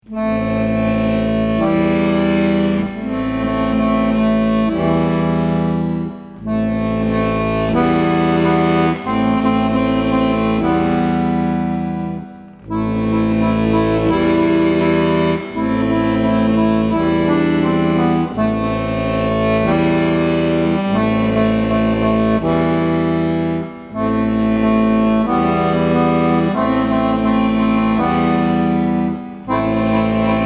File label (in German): Hejo Akkordeon Fassung